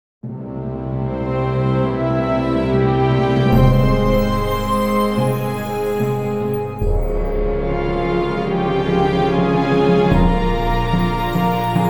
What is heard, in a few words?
Jingle Positive; The Guild 2